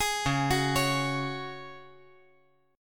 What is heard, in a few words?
Db Suspended 4th Db; arpeggiated